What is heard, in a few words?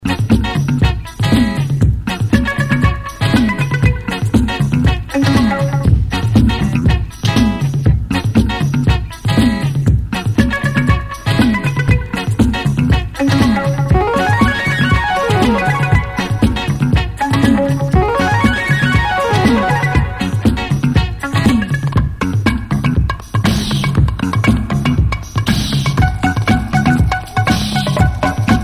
Indian Reggae